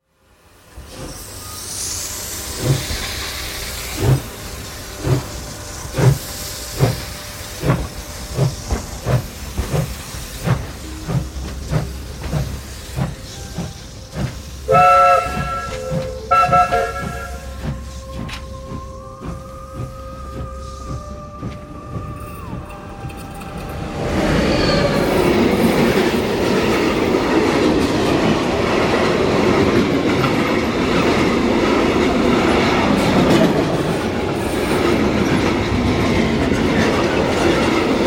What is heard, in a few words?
trains sound fx; boom library